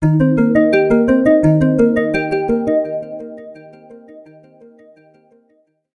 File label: Calm; OnePlus 11